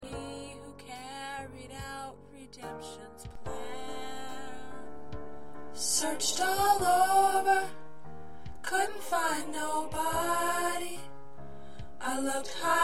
Soprano, choir parts; practice tracks

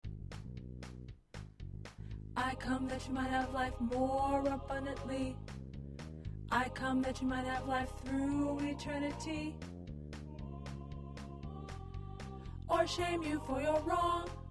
Tenor

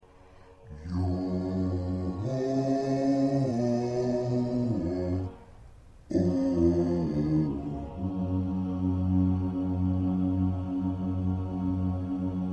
Bass 2, choir parts

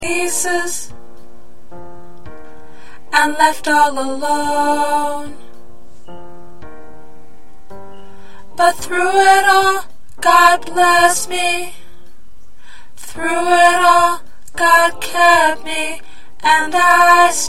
Alto

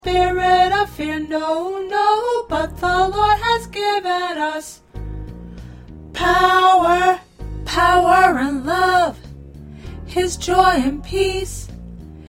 Alto

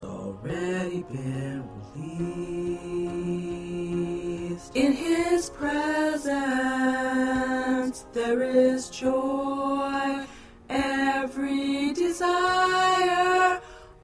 Tenor

